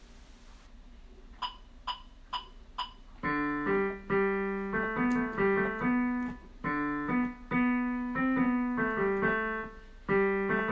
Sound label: Bass